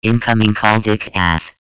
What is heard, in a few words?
Incoming Call